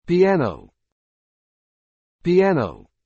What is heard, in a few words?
piano